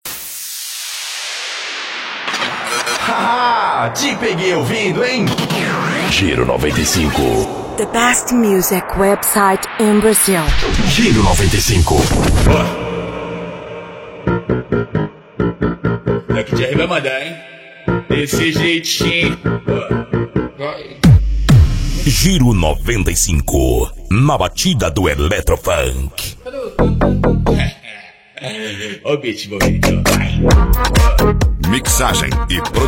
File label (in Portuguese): Na Batida do Electro Funk